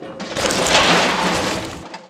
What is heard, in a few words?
weapons explosion debris medium